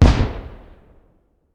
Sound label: Engineer Shot